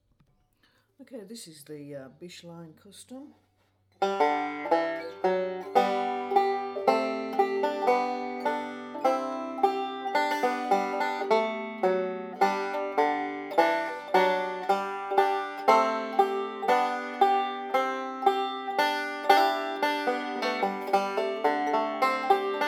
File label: bishline bluegrass, banjo